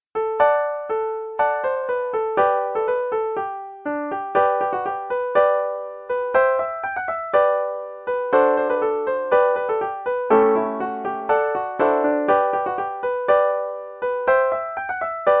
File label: 120 bpm